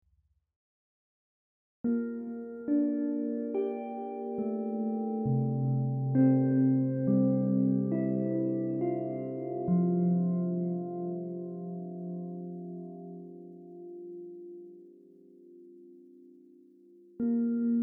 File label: Soft Bells; Changes for January; Hillis Algorithm